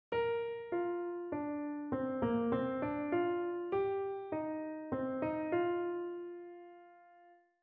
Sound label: Continue the melody example with answer